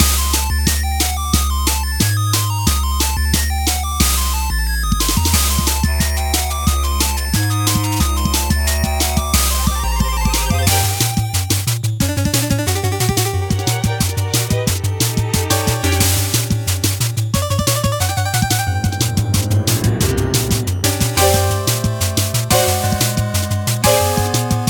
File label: battle midboss phase 2